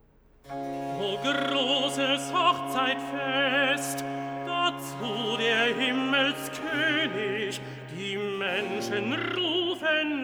Cantata, Recitativo (Tenor); BWV 162 'Ach! ich sehe, itzt, da ich zur Hochzeit gehe'; Bach; Genova; 20th Sun After Trinity

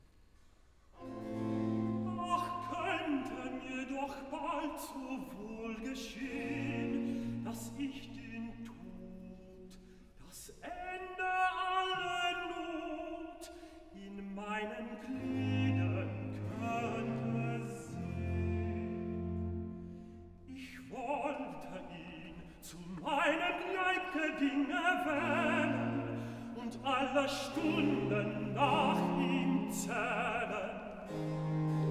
Cantata, Recitativo (Tenor); BWV 95 'Christus, der ist mein Leben'; IV. Recitativo (Tenor) 'Ach, könnte mir doch bal; Bach; 16th Sun After Trinity

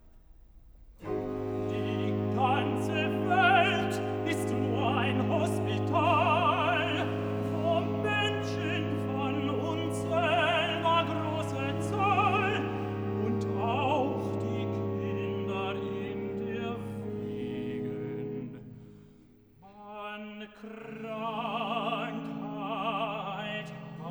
Cantata, Recitativo (Tenor); BWV 25 'Es ist nichts Gesundes an meinem Leibe'; Bach; Ambronay; 14th Sun After Trinity

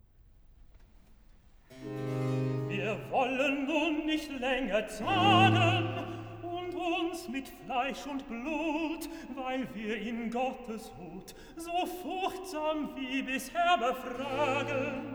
Recitativo; Cantata, BWV 92 'Ich hab in Gottes Herz und Sinn'; Bach; Naarden; Septuagesima

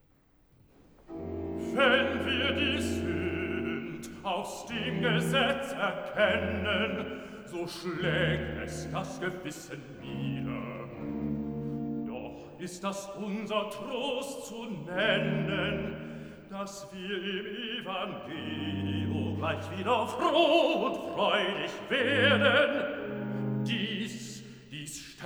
Cantata, Recitativo; BWV 9 'Es ist das Heil uns Kommen her'; Bach; Ansbach; 6th Sun After Trinity

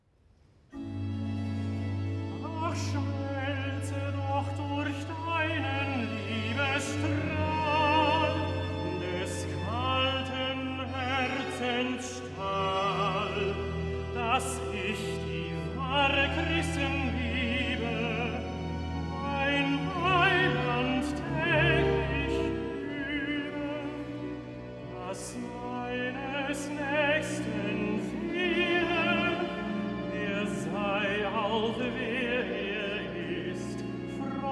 Cantata, Recitativo (Tenor); BWV 164 'Ihr, die ihr euch von Christo nennet'; Bach; Frankfurt; 13th Sun After Trinity

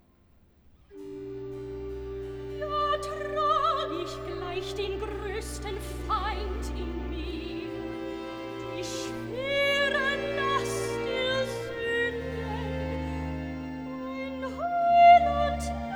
Cantata, Recitativo (Sopran); BWV 139 'Wohl dem, der sich aud seinen Gott'; V. Recitativo (Sopran) 'Ja, trag ich glei; Bach; Winchester; 23rd Sun After Trinity

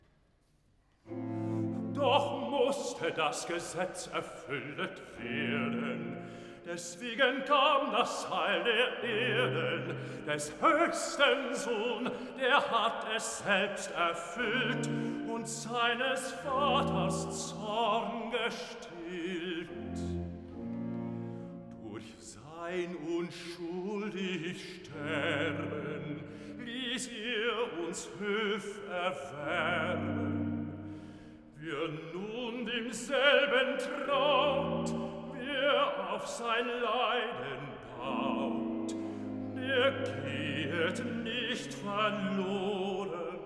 Cantata, Recitativo; BWV 9 'Es ist das Heil uns Kommen her'; Bach; Ansbach; 6th Sun After Trinity

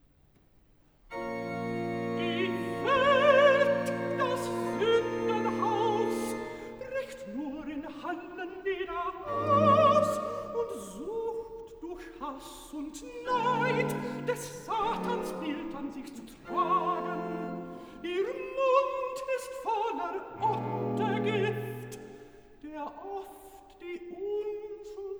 Cantata, Recitativo; BWV 170 'Vergnügte Ruh, beliebte Seelenlust'; 'Die Welt, das Sündenhaus'; Bach; Ansbach; 6th Sun After Trinity

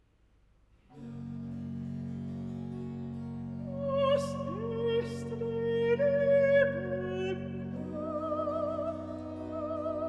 Cantata, Recitativo; BWV 169 'Gott soll allein mein Herze haben'; Bach; Leipzig; 18th Sun After Trinity

